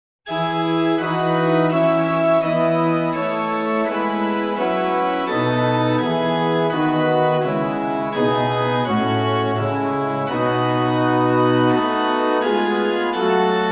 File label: Instrumental